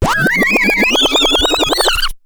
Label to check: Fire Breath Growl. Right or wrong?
Wrong